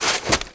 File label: crawl, footsteps